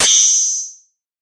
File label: FM Cymbal, harsh; Harsh FM Cymbals